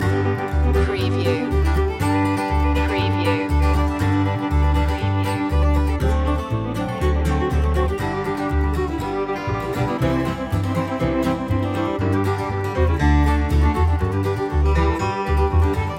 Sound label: hoedown loop